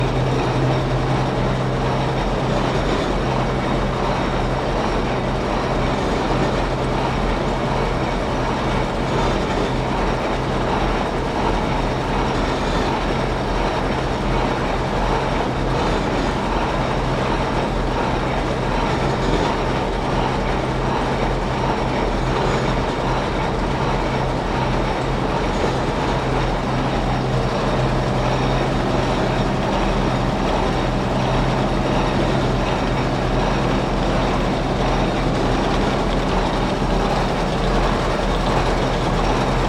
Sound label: Second floor purifiers atmo, mill